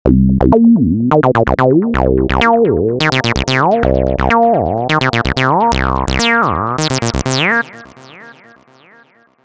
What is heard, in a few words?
acid bass